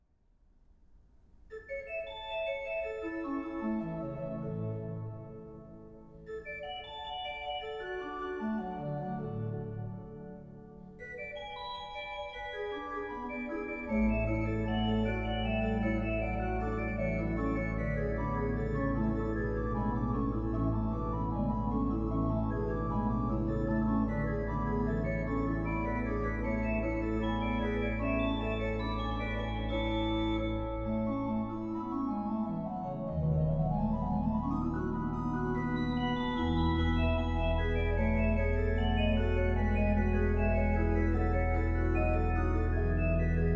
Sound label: Prelude in A major, Organ; Bach; Christopher Herrick; The Complete Organ Music; BWV536 Prelude and Fugue in A major